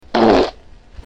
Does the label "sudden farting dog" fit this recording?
Yes